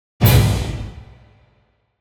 Symphonic Hit Short Final Hits - Stronger And Higher; Hollywood Edge; sound designer's toolkit v2